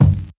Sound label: clear steel kick drum sample g key